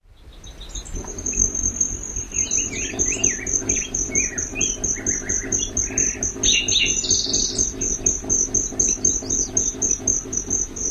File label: flight display